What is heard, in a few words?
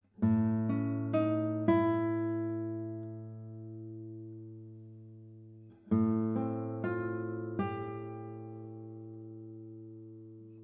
Guitar Solo; Two Pieces For Guitar Solo